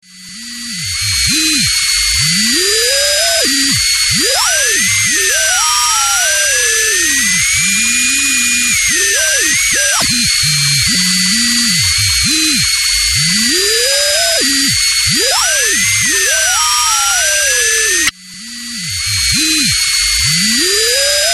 Radio Wave

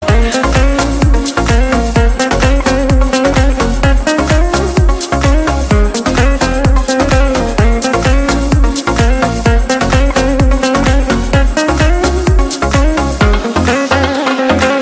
Instum

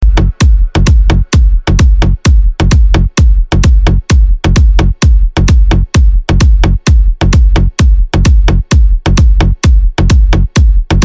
Beat Mix

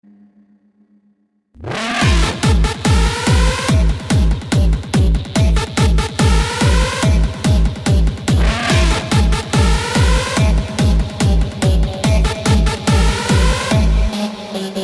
Hardstyle message

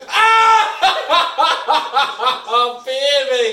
yelling

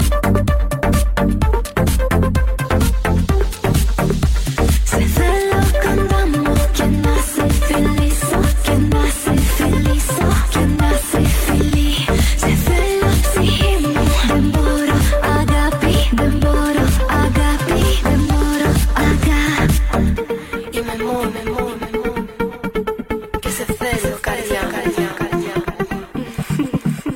New Vibe House Hit

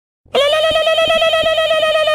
Bomb; GMOD Jihad

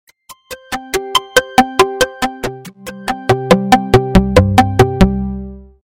3d Music

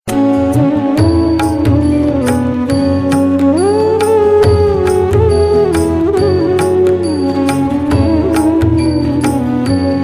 Flute Manjira

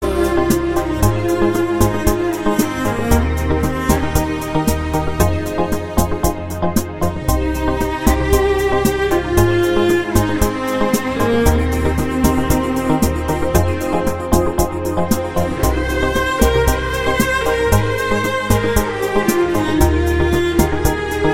Soothing Instrumenta

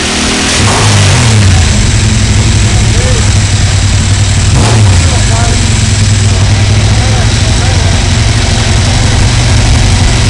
Ducatti 998 Start

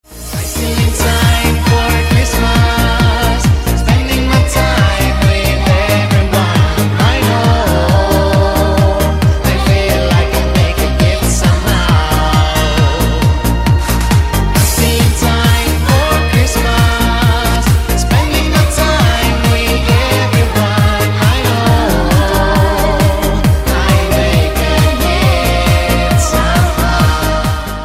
X-mas Melody

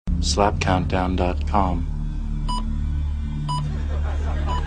Slap Countdown; Himym

